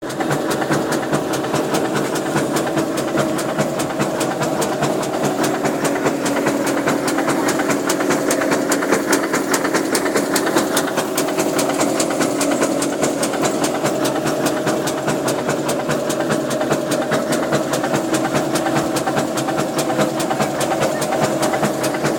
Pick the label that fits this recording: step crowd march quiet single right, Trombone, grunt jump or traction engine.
traction engine